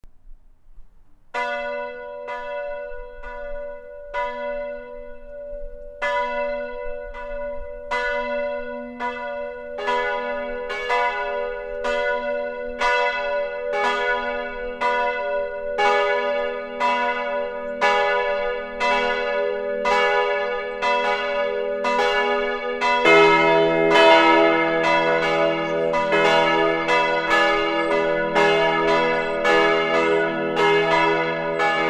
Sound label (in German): Glocke E GIS H; St. Nikolaus